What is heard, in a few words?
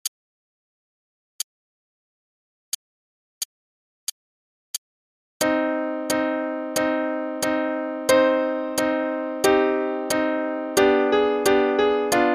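Play-along, quarter note=90; Khachaturian - Ivan's Song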